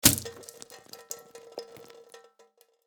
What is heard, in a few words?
Blood or gore splatter glass surface